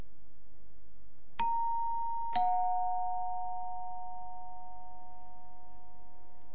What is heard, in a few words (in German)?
zweiklang